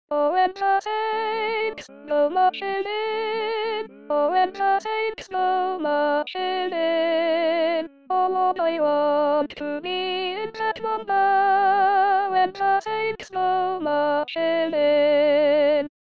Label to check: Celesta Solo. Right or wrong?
Wrong